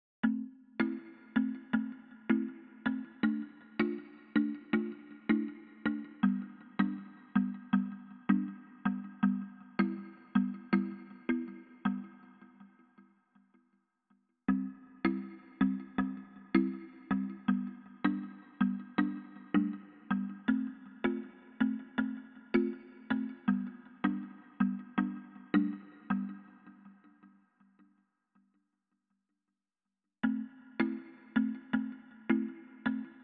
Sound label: Kalimba Morph